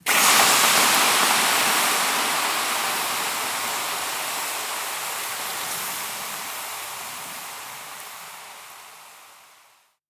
Extinguishing Fire

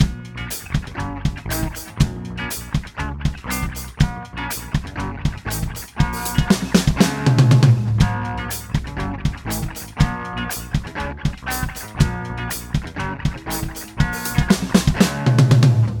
Guitar Rhythm

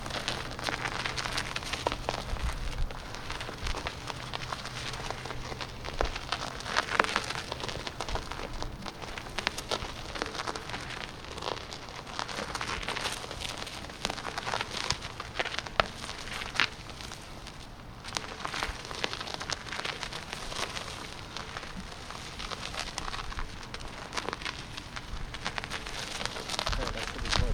Tire Rolling

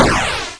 Crash

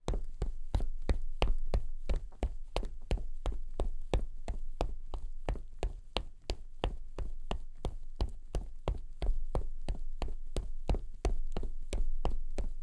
Running Concrete

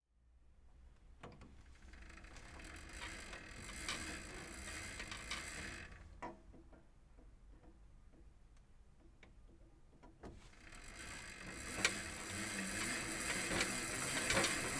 Home Gym Cycling Machine

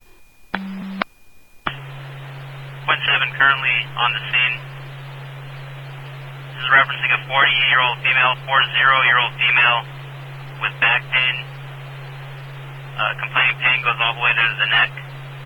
Police Fire Ems Random

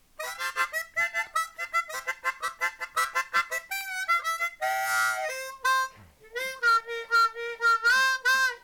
Harp

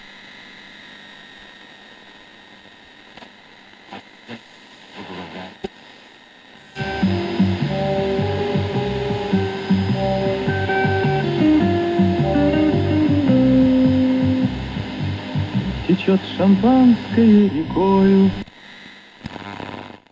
AM Radio Song

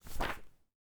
Page Turn

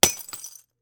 Glass Break Hammer

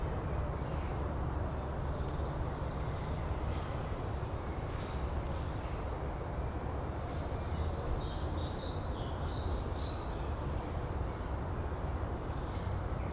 Calm City Park Ambience, Zaltbommel

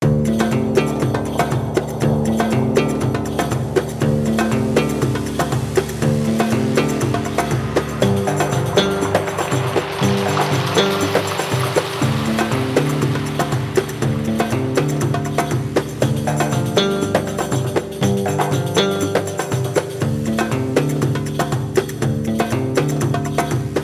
Magreb night loop

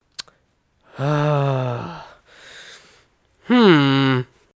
Ahhhhmmm

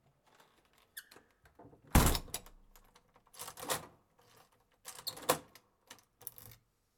Door

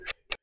Button Push

